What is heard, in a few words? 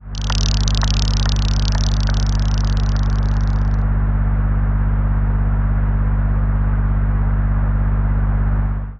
timing chain failing sound